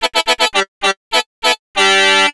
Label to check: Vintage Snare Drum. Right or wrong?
Wrong